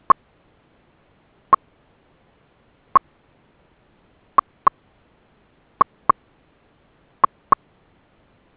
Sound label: Beacon Mode 0; BeaconMode3